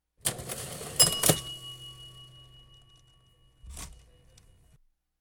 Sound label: Cash Register bing